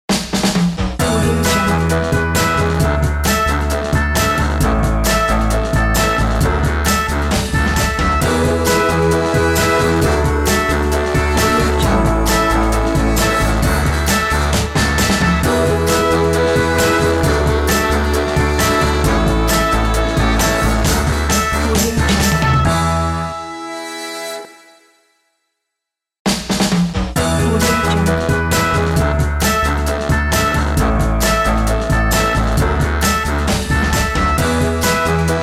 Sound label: retro soul radio logo